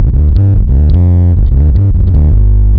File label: Mat Bass; Drum & Bass; AMG - Prototype Drum & Bass